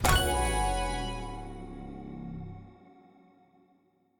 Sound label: gold crate open